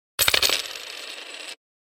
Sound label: spinning coin on table